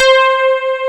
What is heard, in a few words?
PIAN. PAD C5; Big Fish Audio; Synth City